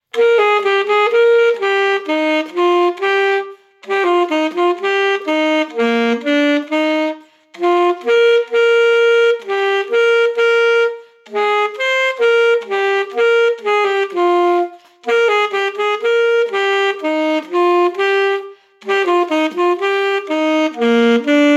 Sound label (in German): Froehliche Weihnacht ueberall, Saxophon